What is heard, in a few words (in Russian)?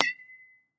zvuki beisbola